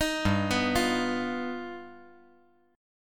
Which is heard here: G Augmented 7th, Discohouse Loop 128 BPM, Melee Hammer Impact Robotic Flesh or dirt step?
G Augmented 7th